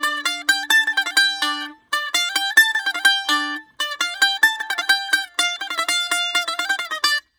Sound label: 128 BAG, BAGLAM, Mediteranean Traditions; PH BAGLAM